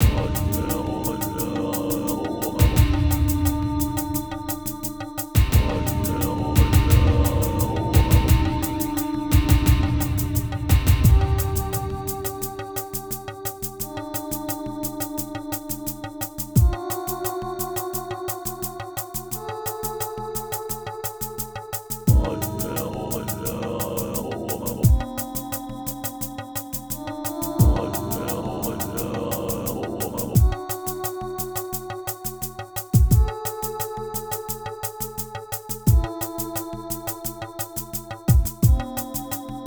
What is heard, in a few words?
Elevator Concept; Inner Demons